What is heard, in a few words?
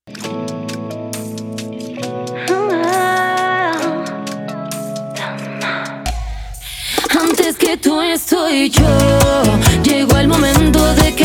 bachata